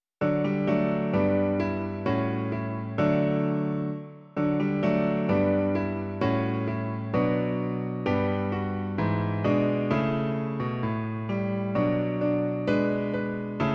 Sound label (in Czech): Tobě, Pane, patří dík - klavír; Klavírní verze